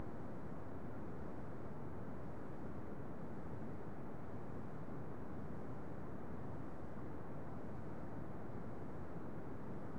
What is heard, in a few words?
wind; twinStick